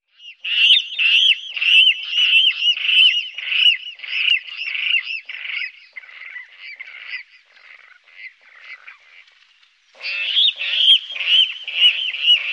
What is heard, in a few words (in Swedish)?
Vattenrall, Faglar